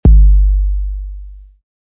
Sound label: 808 after